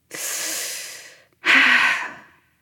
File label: nv sigh; smite; awilix